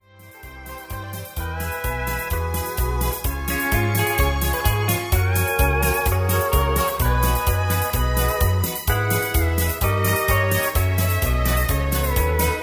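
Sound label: Instrumental with background vocals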